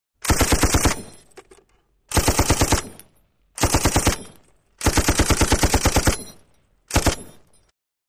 M-60 Automatic Machine Gun Bursts 1; Good Distinctive Bolt Action 1.